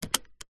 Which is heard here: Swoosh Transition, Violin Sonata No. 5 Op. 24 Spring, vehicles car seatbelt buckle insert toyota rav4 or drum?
vehicles car seatbelt buckle insert toyota rav4